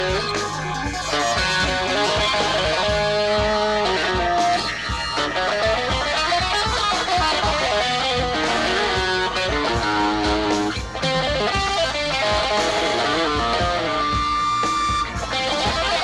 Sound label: Tango